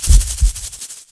medipack; Shadow Man

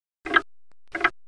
clock; KARUTA

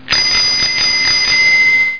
ring

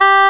Oboe (G3); X-Wing; X-Wing Patches

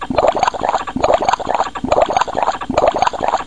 2 Bubbles; Internet 1996 World Exposition; Japan; Fujitsu

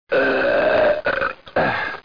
brup; Transcription: / Ugh...

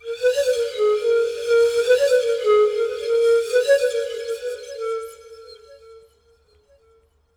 flute, ethno; eJay

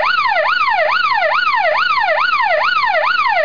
Siren; Slot Machine; Slot98

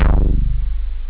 short, synth bass

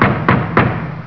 3 KNOCKS

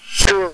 Plant spit; Curse of Dragor

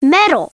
metal

